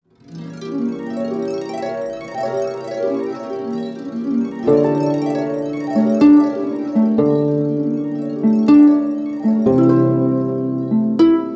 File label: harp